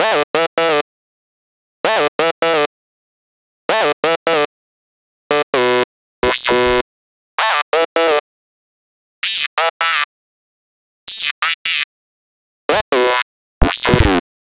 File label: Lead, EDM; Sonic Foundry (Sony Creative Software); Afterhours EDM